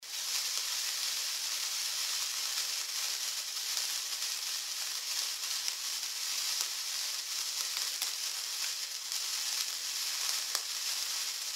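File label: bengal ogon, Bengal fire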